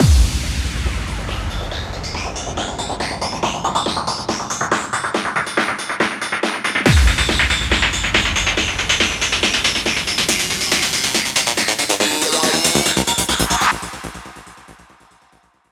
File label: 140BPM 8Bars, HandsUp & Trance Fills; GiveMe; Vengeance Samples